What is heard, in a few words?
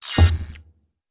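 magic sounds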